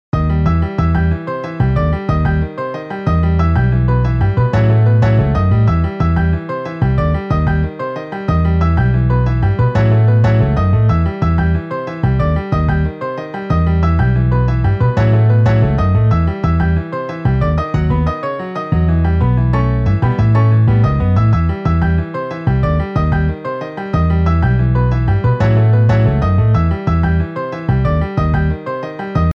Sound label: Piano Bass MIDI